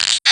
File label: rose; narcissus